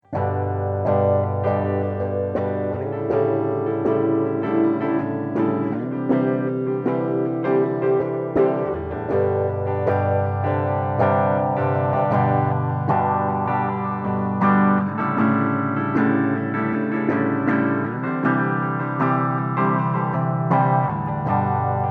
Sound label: half depth half feedback guitar, phase shifter